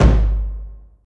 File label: huge bass drum single hit G sharp key, kick sample tuned to the G# note